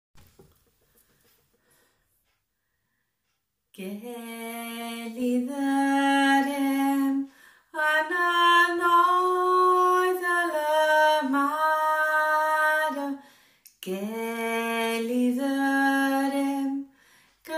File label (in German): Katalanisches Lied